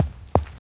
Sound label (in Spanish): pasos de un perro o